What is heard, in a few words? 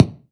BD, BASS DRUMS; EdgeSounds